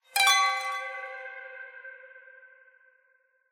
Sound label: Mystery Pluck; unrest indigo